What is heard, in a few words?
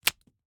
Slap bracelet, smack, slap, whip, felt, plastic, release; Eiravaein Works; Vaeyan IV